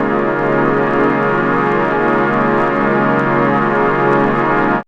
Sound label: BRASS PAD; ATHMOSPHERE